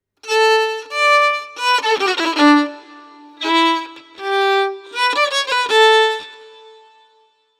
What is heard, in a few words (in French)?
impro violon; loto sonore